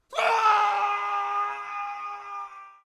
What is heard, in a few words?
Adult male scream injured and suffering